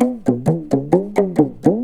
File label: PAPAN, Indian Traditions